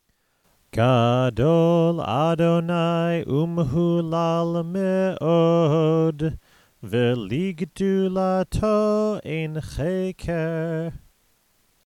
ashrei

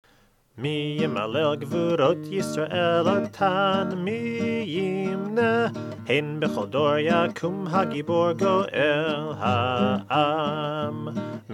Mi Ymaleil Hebrew English

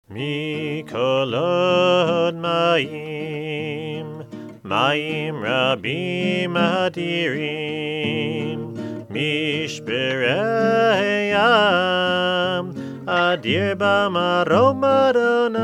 Mikolot Melody; Red Book

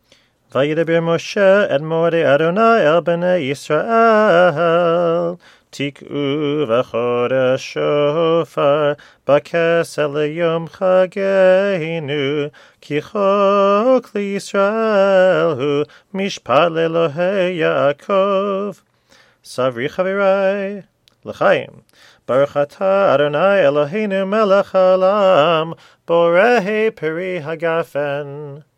Kiddush

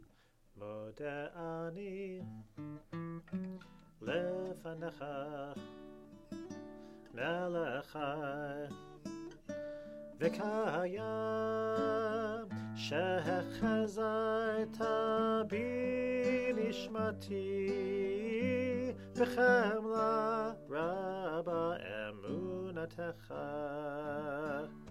modeh ani repeating

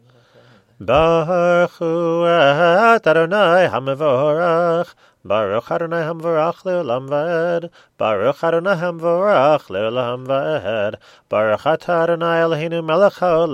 barchu

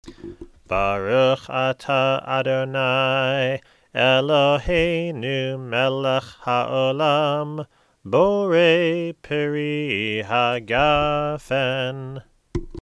Borei Pri Hagafen; Shabbat Home Rituals

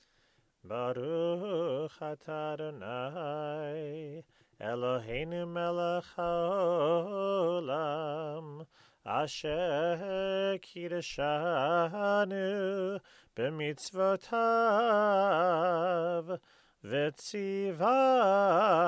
blessing before hallel

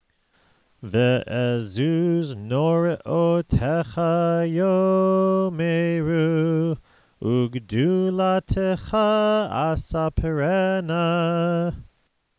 ashrei

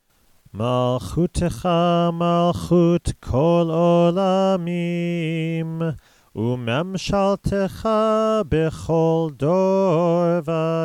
ashrei

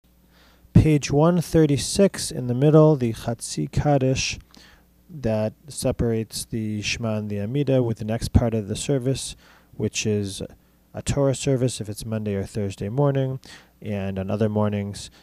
chatsi kaddish pre torah service; daily shacharit

